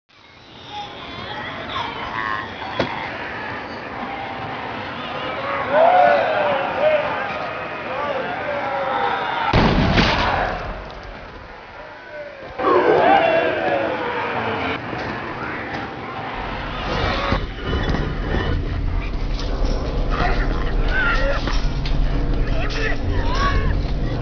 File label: ambient; spawngirlsounds; dawn of dead ambient